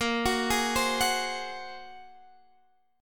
Bb 7th Suspended 2nd Sharp 5th Bb, Guitar Half Step Down; Bb7sus2#5